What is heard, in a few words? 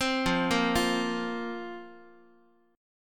F Suspended 4th Guitar, chord; Fsus4 arpeggiated; x,x,3,3,2,4; JGuitar